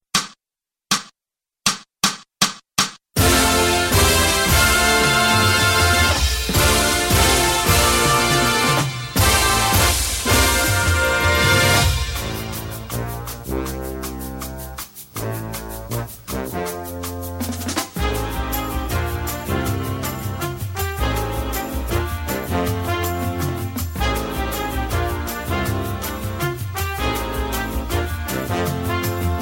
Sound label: w Drum Feat w Clicks